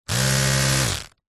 household electric toothbrush on off short burst close up; zapsplat